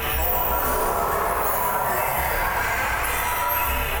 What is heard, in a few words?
Tonal Re Draw, sparse soundscape